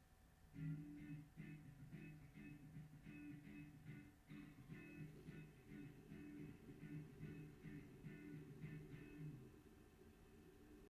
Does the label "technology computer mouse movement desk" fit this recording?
No